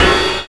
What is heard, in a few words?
ride, trash kit; USB Soundscan vol.11; Drums Dance & Groove; AKAI